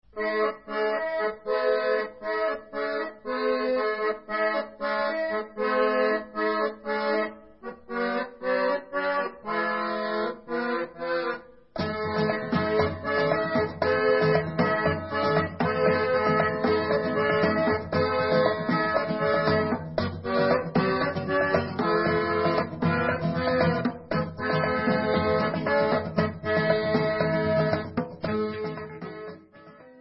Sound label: Debka Instrumental